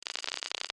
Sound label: noiz